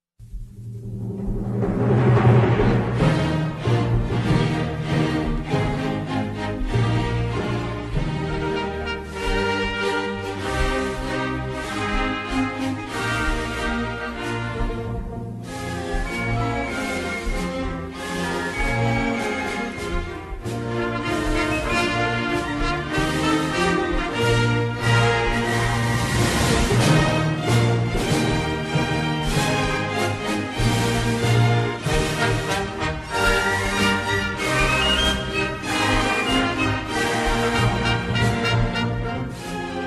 anthem

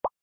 zte tap

